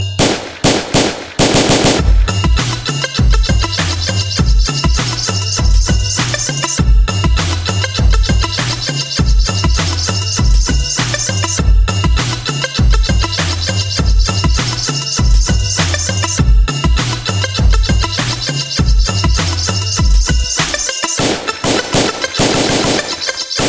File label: hip hop style